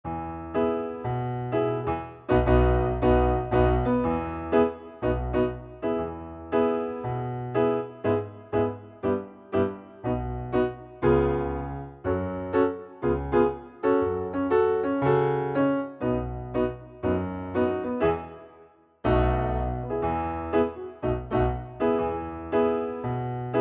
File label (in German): Piano E-Dur